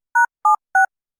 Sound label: High frequency UP; 高周波数の音量を上げ下げできます。; 補聴器; 言葉の子音が強調されますが、上げすぎるとロボットのような声になります; AMP補聴器調整用